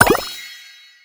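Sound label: frisbee fly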